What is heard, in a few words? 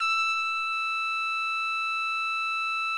TRUMPET; Best Service; Killer Horns